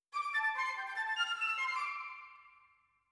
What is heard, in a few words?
flutes; Netease; kill boss phoenix